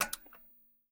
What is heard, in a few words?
Lamp Switch; MiSide